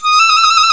FLT FIFE, British Fife, Fife Trills; Roland LCDP04 Orchestral Winds; 90 s Sample CDs

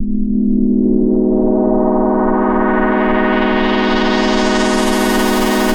Chaos Pad; Sonic Foundry (Sony Creative Software)

